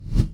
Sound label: hover